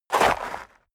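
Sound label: step dress boots gravel light jump, footsteps; schritte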